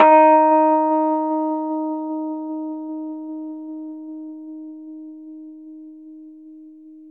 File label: Rhodes D#; 3D Sound; 3D Keys VOL-1